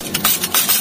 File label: Cash Out